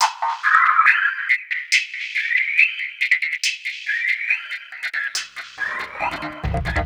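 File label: Loop, beats; Sonic Foundry (Sony Creative Software); Esoterik Beatz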